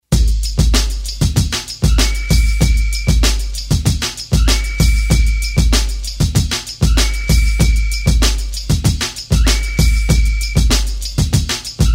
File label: Beat